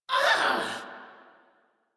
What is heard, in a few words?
Pain; Warframe; Sprag; en